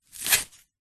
foley long hair cut with scissors single cut

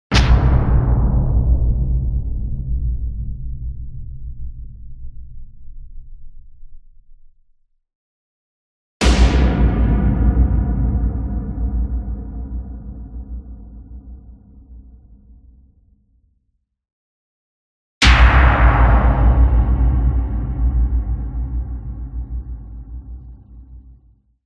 CINEMATIC HIT Fire Boom; smartsound

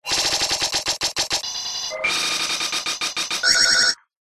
science fiction machine glitch

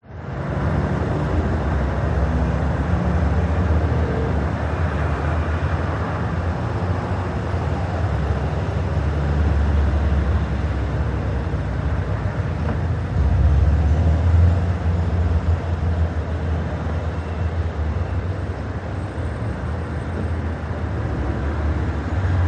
Traffic City; Audio Hero

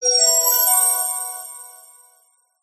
multimedia game tone bright positive gain award; zapsplat

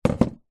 household snow globe set down table; zapsplat

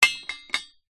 household padlock large movement on metal

